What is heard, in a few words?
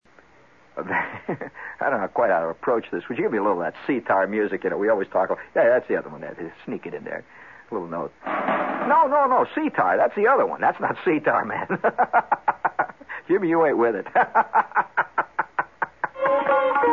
Sitar Music